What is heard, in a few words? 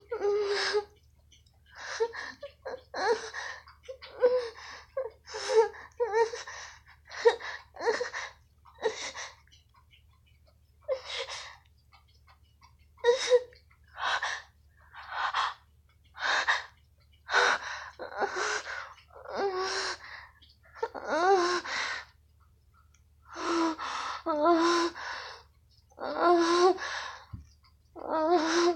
noise